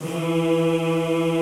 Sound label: E2 R, Classical Choir; Best Service; Extended Classical Choir